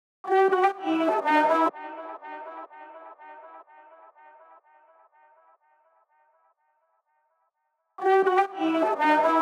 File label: 124 BPM E Min Flourish, Melody Loops; Loop Stems; Cymatics; Anubis